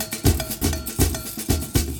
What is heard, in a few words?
Drumline Loop